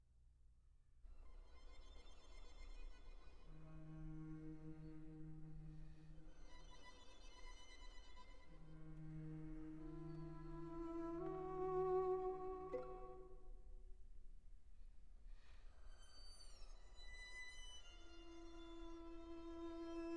sehr langsam funf satze fur streichquartett

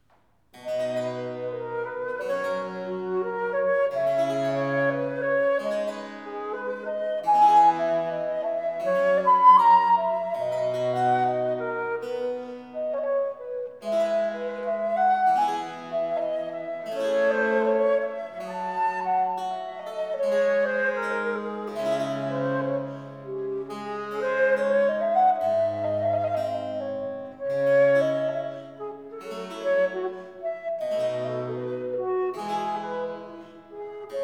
sonata fur flote und b c c dur andante presto; johann sebastian bach; bwv 1033

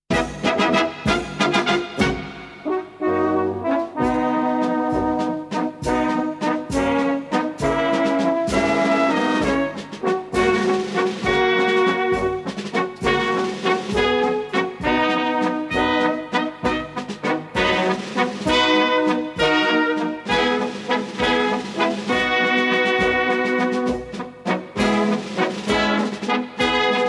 militarmarsche; deutsche militarmarsche; im grunen wald

